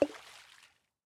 mine fish; Factorio

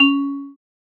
vibraphone, programmable speaker

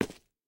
rails, walking; Factorio

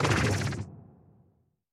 biter call behemoth, creatures

